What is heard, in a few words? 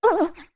Ledge Fall; Deku Link; Link falling off a ledge